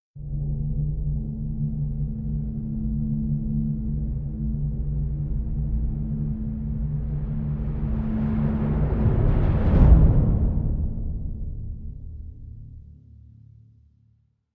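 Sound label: Orchestral Crescendo, No Snares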